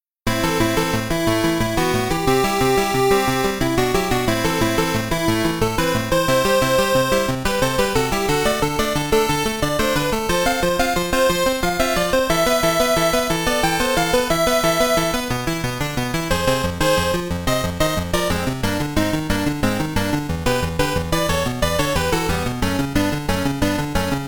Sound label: Mechanical Globule BGM; Sixth Stage - Cell BGM